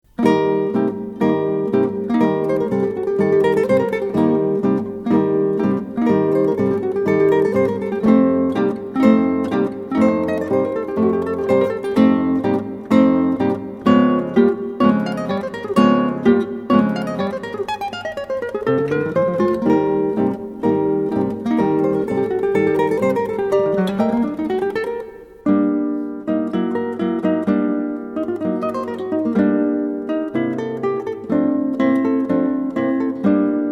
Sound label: Tuileries Childrens Quarreling at Play